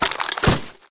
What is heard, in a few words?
fire fall; Golden Crayon Sounds